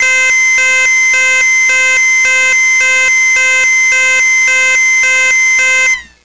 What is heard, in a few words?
ambulance